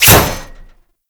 Radial Blast Impact Metal D; Excalibur

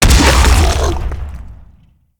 Infested Femur Jump Land

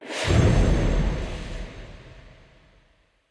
Wolf Cast End; Orengall

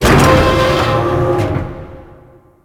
Piston Machine; Warframe; Grineer

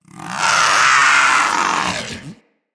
Vocal Death; Characters; Hunting Critters; Vulpaphyla

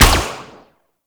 Minigun Shot; Warframe; Corpus

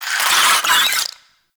Infested Ashen Vocal Attack; Warframe

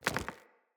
Mockery Foley Movement; Enemy; Mockeries; Characters

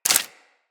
Bullet Impact Debris Wood

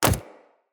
companion step default, footsteps; Pets; Zanuka

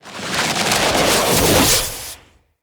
Siege Engine Cannon Projectile By; Weapons; Enemy; Artillery; Ode Siege Engine

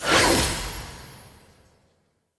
Polearm Multi Throw; Runes

